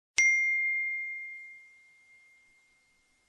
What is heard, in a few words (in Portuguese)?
Toque de notificacao iPhone 12 original